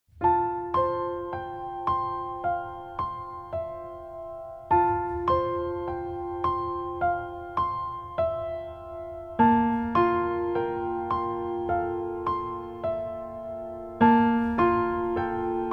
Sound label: the shade of a tree piano